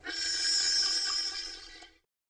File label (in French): violon PSOLA; TDIPSOLA; VUV